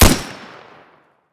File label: shoot; EMXArms; emx rivet